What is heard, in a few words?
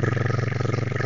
flying; yachtpit